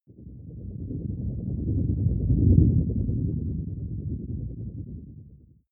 whoosh storm wobble; swooshes-swishes

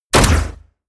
rifle assault rifle fire; comedy-cartoon; Pistols and guns; pistolen-gewehre

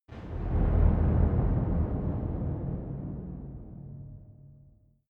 heavy dark door

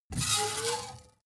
metal screech phaser fast

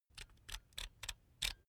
electric drill template adjust soft; soundpool

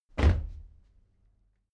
step military march stop

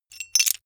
metal pieces clatter short

